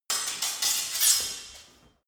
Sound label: sword parry sword